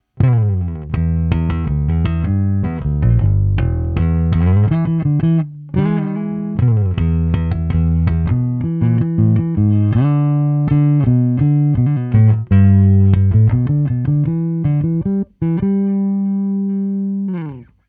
Preci Clean NXT210

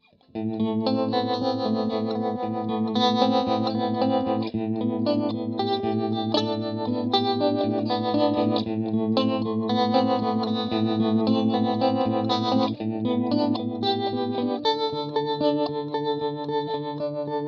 Vintage Trem

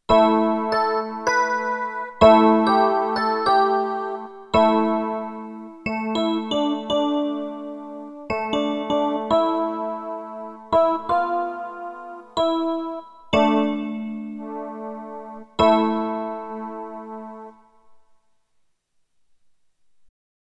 Epiano

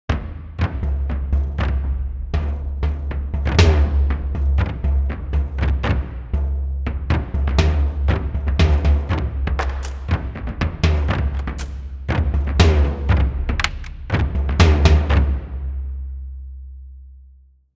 Percussion; Hans Zimmer Percussion Prof

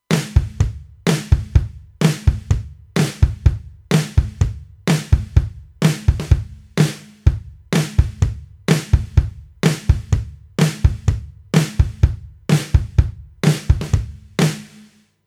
Drums Black Lion

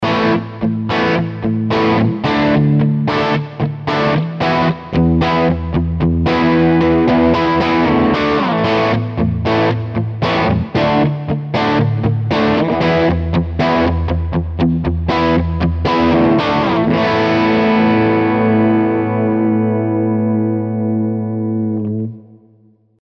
Framus Diablo Pro Bridge PU split crunch

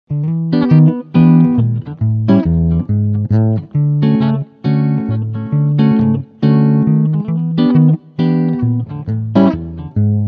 II V I Frontpickup

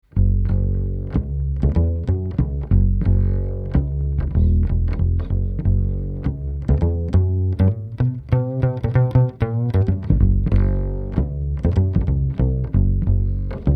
aer amp one kontrabass

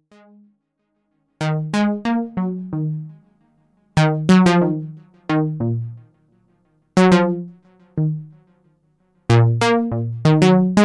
aleatoric sequ; minimax

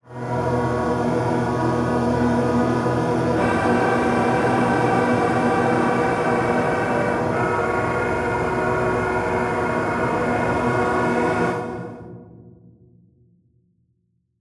Bubbling Tension; Imaginando FRMS

